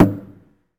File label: Cello Hit; SFX Kit; Foley - Misc